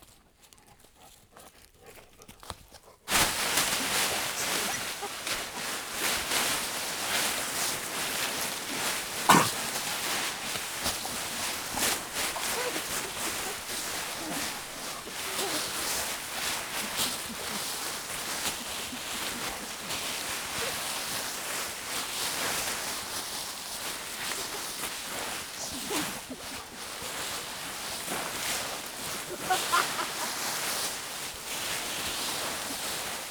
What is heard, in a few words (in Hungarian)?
lombba való beleugrás, hempergés; lombba ugralas 2016; varboc